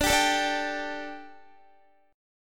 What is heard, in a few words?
D Suspended 4th D, Guitar; Dsus4; strummed; x,17,17,14,14,14